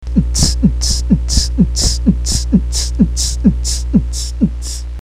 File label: ass techno